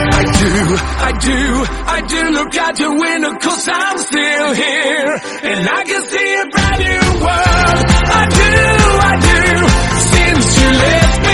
hip hop english song tone ringtone

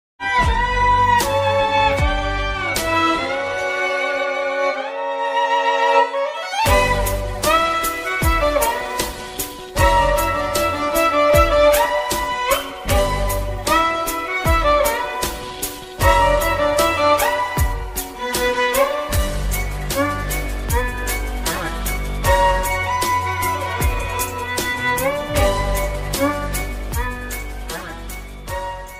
violin ringtone; arcane

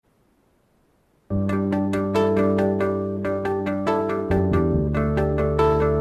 msg ringtone

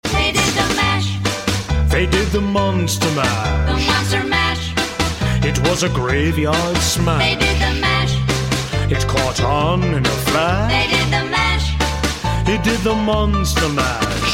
cute musical tone ringtone

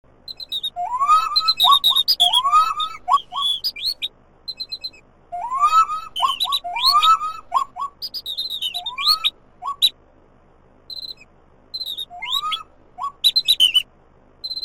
nature voices ringtone

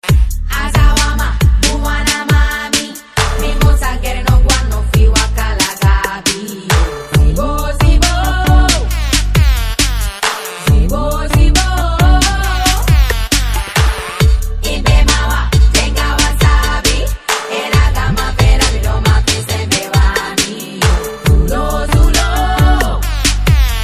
theme ringtone; kurilae theme

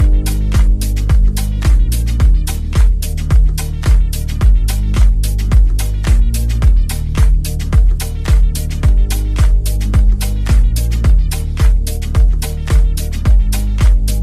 bollywood music ringtone

